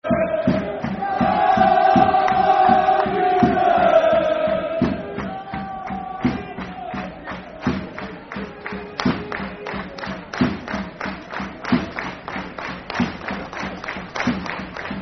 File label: Drums, Football Chant, Soccer Song; Shrewsbury Town Stfc